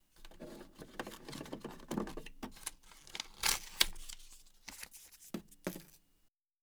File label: Cash Register SANYO ECR 335 Bon Exchange Paper Movement; Electric; The Soundcatcher